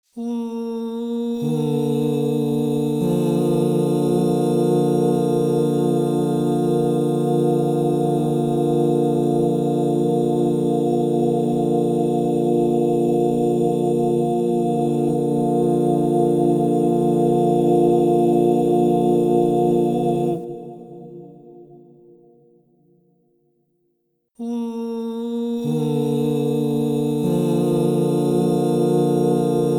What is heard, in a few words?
Si bemol major, crystal bowls; Yoga and sound